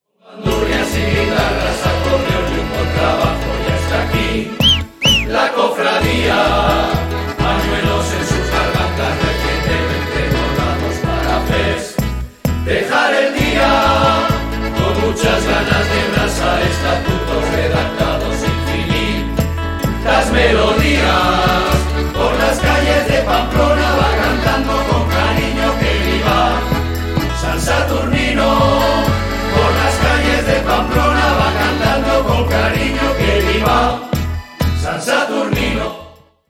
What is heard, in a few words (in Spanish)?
HIMNO; COFRA